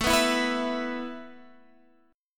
A Suspended 2nd down Guitar Drop D

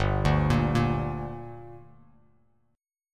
G Minor G, Bass Standard; arpeggiated; 3,5,8,3; JGuitar

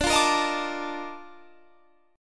A sharp Major 11th D

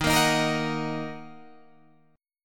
Eb Minor, Guitar, Drop D

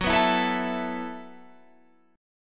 E Suspended 2nd Suspended 4th Gb

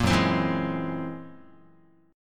F sharp 9th Flat 5th F sharp Guitar; F#9b5 strummed

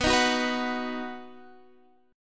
B-Major B down

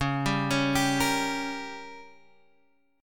Bb Minor 6th, guitar

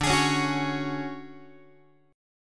D Major Sharp 11th D Guitar, chord; DM#11 strummed

